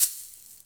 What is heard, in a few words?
sfx perc